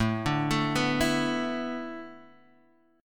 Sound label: A Suspended 2nd Suspended 4th A Guitar Half Step Down, chord